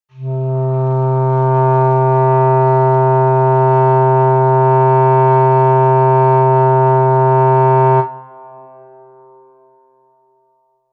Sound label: clarinet